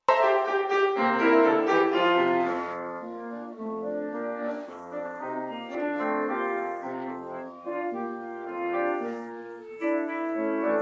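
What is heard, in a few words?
backing track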